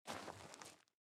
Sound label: step gravel; gamerip; Out of Reach: Treasure Royale